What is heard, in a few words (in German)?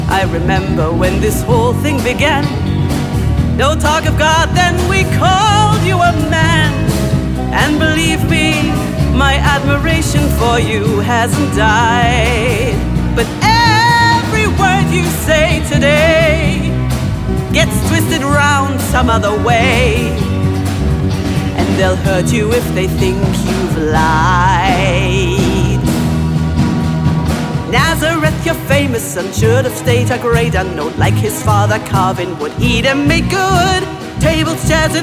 Gesang Musical; Judas; LOFT VOICES